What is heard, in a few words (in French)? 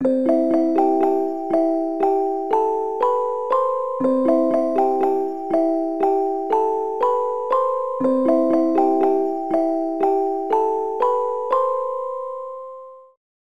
creation carillon